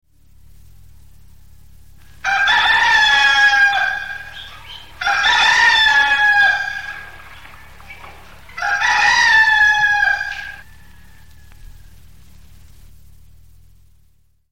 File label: rooster ringtone; android